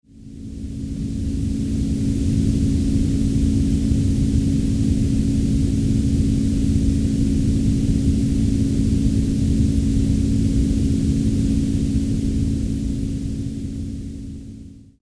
55 Hz Gamma Wave Binaural Beats Noise; binaural tones gamma 55hz with noise

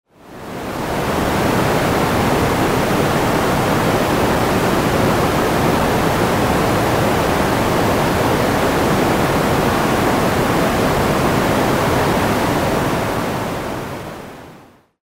silver noise

